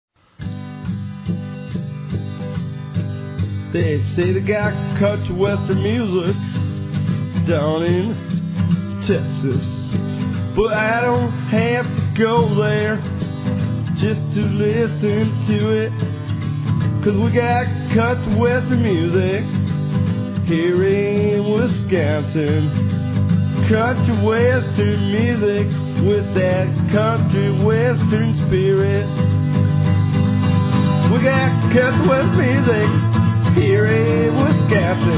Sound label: Country Western Music